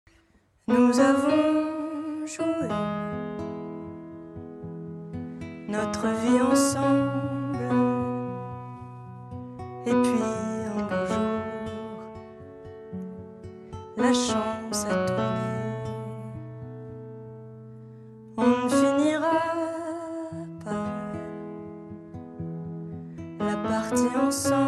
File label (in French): voix 3 guide vocal